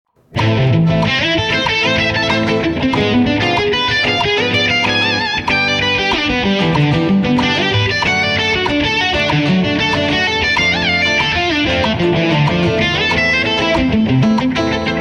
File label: arpeggio soloing, guitar